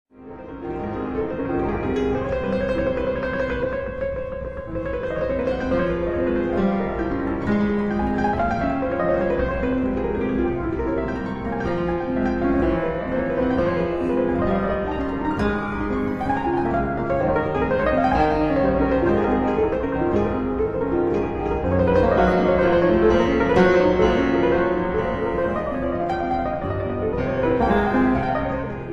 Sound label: Etudes for piano in F minor